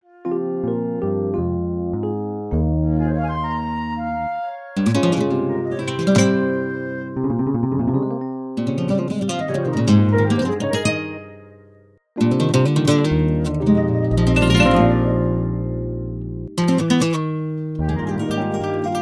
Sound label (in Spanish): flamenco